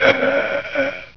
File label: BREATH